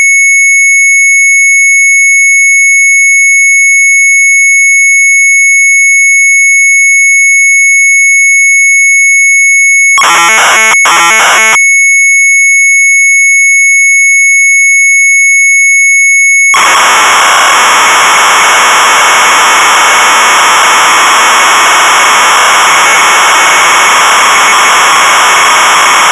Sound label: Sharp MZ800 Tape; Supercopy; retromelsarchive